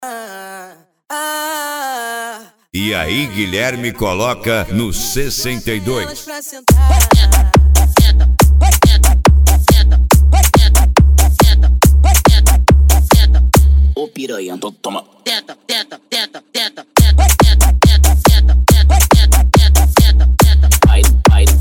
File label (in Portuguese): esp funk atack